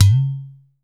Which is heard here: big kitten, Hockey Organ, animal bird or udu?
udu